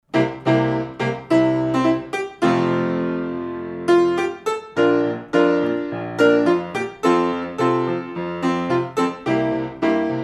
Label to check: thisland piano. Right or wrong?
Right